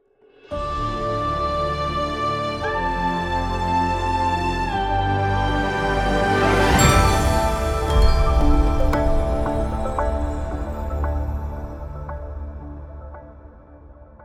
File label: Stinger; wellspring Get Leash